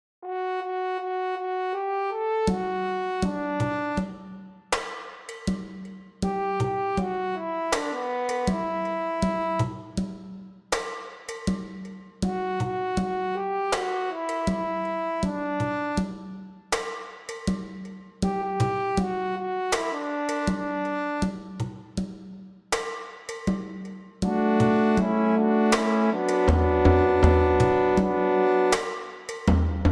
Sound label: Karaoke; Haleluia